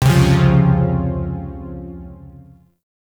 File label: Shawzin Phrygian Chord D; Warframe; Zariman Shawzin; Chords